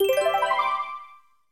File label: Purple Coin Appear